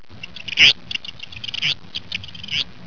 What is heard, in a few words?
bird